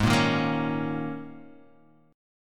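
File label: Ab Suspended 2nd Suspended 4th chord, Guitar, Half Step Down tuning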